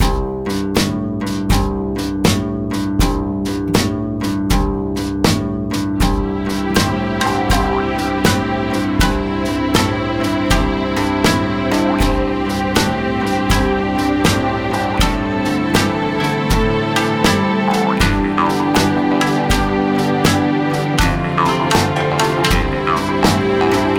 dialog

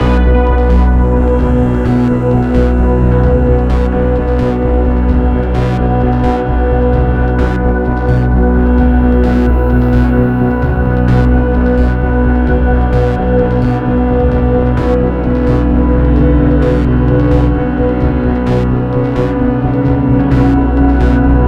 Calm; Everspace 2

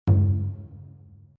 Notestone; Glitch Hero

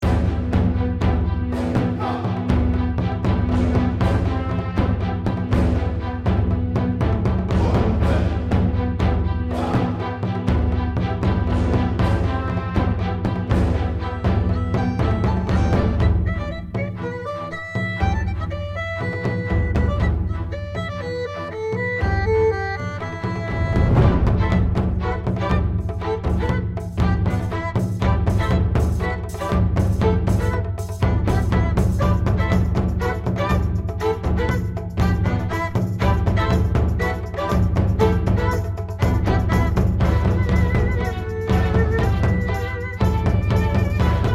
music battle

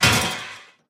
Chandelier Break

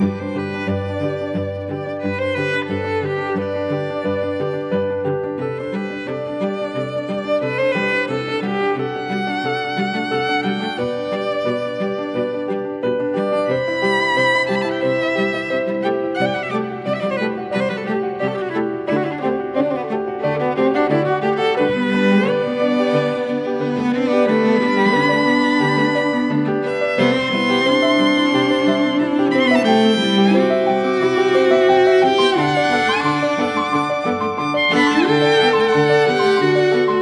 Music Level Adulthood Map

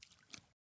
water jump

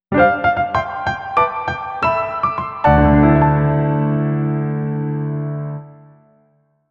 Map Unlock Jingle; Onward!

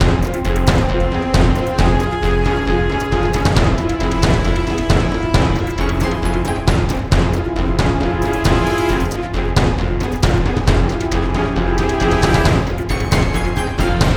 Boss Music; Naga Boss Music

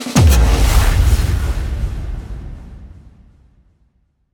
Start Stinger; Void Bastards

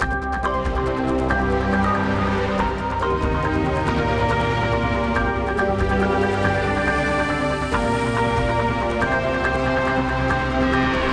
Player Defeat

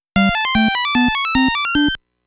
Perfect Stage Clear; Ninja JaJaMaru Collection

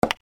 Button Sound; Bloodungeon

